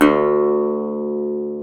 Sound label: GTR Dobros National; Club-50 - Foundations Roland